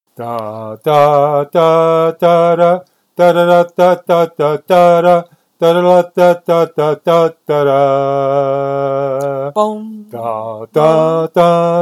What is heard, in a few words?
Mystery Spanish Song; which song do you enjoy singing